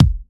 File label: Sub Perc, Bassdrums; Vengeance Samples